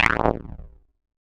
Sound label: enemy hit